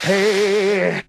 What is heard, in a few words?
"hey", male SUNG